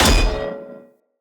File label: Shield Impact Player Flesh; Weapon Impacts; Enemy; Shields; Sinecure Shield